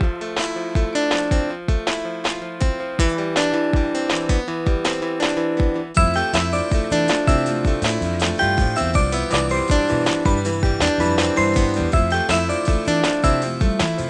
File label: Bgmusic; Imagine Artist; NDS